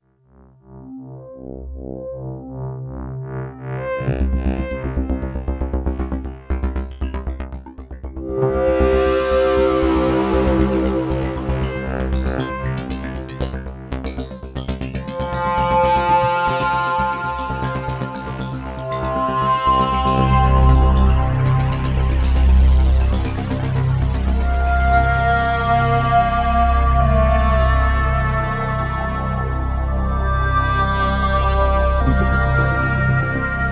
G2 Dramatic SEQ

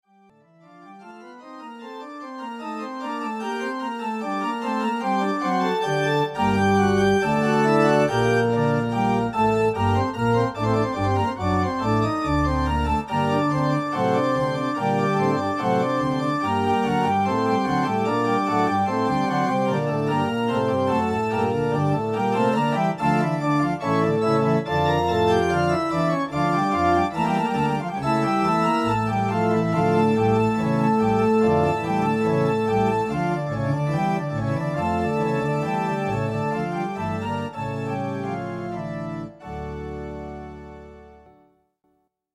Organ; KOR K61P

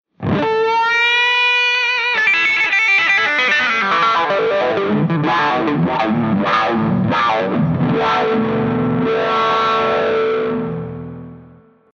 Mega Wide Wail; GFXKILOWAH

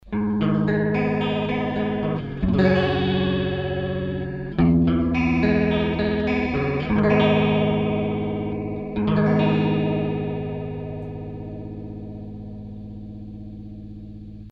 fast Chorus

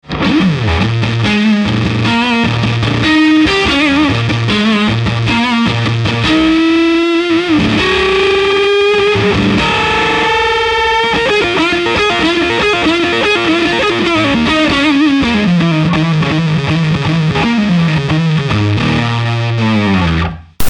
Swamp Fuzz

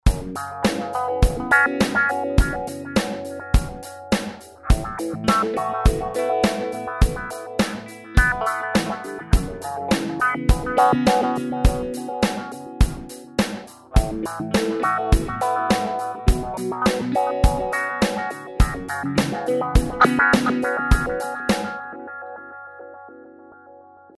random flanger

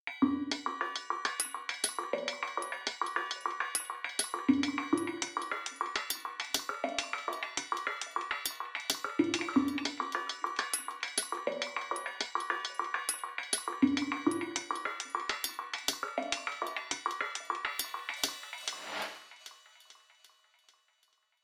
Singing Cymbals

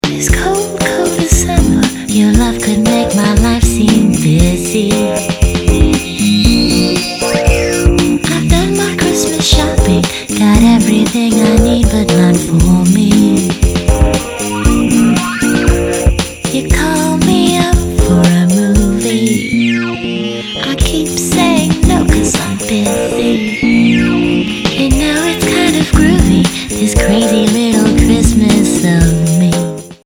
Xmas

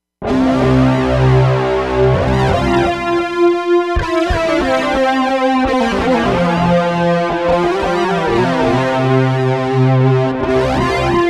Muff; Muff Mistress Memory

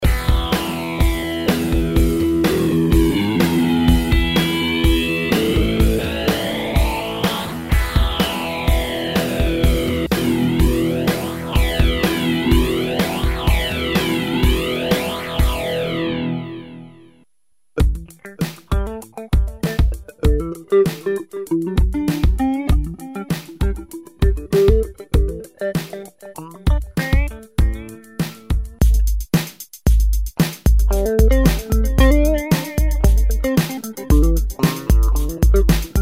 flanger

